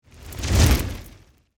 Torch Pass By, Fire, Torch, Pass, By, Heavy, Crackle, Whoosh; Flames; BlastWave